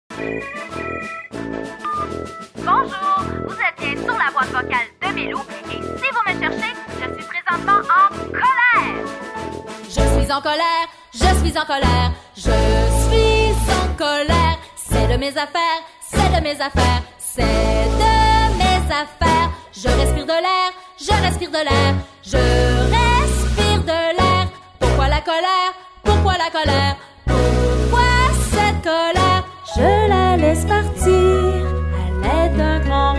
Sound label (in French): chanson de la colere